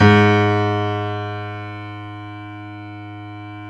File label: G#1, CONCERT PF; AKAI S-Series CD-ROM Sound Library VOL-7